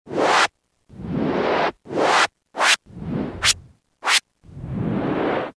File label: Reverse Nz; Roland M-DC1